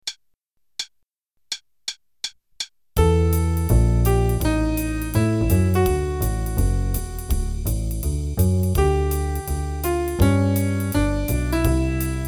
Play a long, sample head qn=166, Jazz Improv Play-a-Long